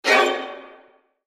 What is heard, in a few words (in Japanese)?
戦慄が走る音